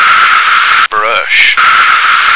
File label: brush